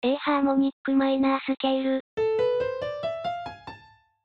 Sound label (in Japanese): ハーモニックマイナー